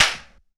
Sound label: Heavy Face Slap